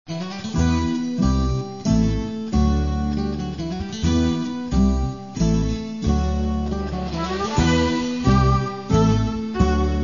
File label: orchestra